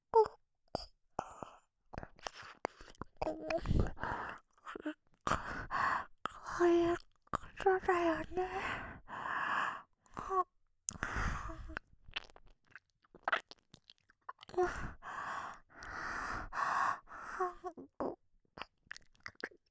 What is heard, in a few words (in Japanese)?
はぐ…ぁぐ…, 右耳